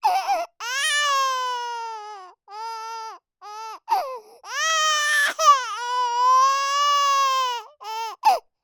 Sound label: Baby, 4 Months Old, Cry, Frustrated, Forlorn